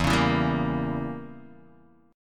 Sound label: E Diminished 7th E down Guitar Open D